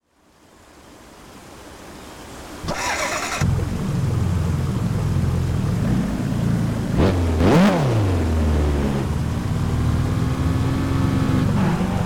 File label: alfa romeo 4c